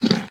eat, horse; minecraft; mob

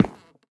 step, cherry wood; minecraft; block

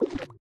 charge, sculk; minecraft; block

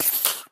say, creeper; minecraft 1.21.4; mob

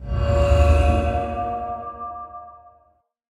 beacon power; minecraft; block

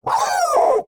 bark, wolf; minecraft; mob; puglin

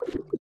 charge, sculk; minecraft

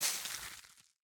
bonemeal; minecraft 25w18a; item

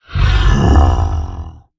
idle, ravager; minecraft; mob

